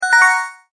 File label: multimedia tone advance; Tones; Sound Design; McKinney Sound